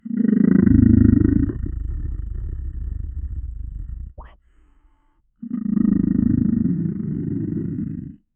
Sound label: deep low frequency reptil